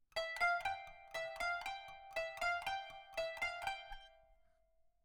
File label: kanun